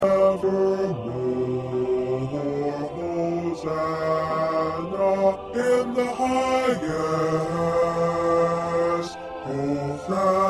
2nd Bass

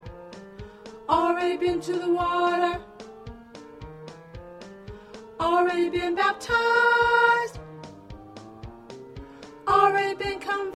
Alto, choir

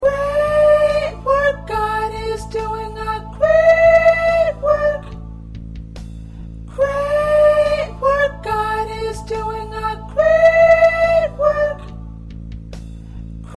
2nd Soprano

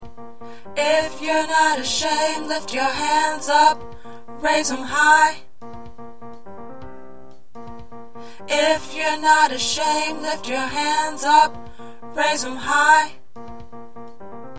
Tenor, choir

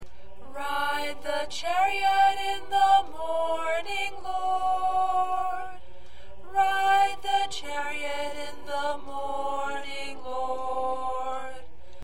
Alto

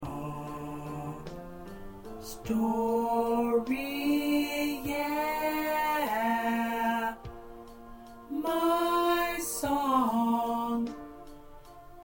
Choir Tenor; gospel songs; part preview

